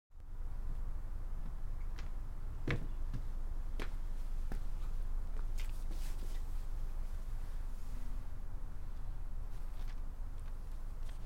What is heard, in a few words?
Ripping paper